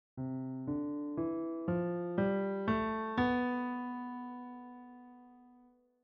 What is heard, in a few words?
major scale leading tone